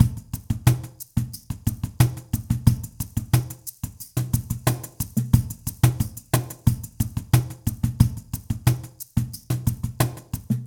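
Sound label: Extensive, chillout; чиллаут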